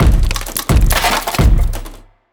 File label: Drumloop; Ghosthack